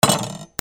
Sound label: KITCHEN